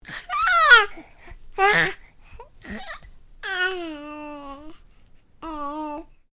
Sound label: Baby: making vocal noises, happy sounding; Babies; Voice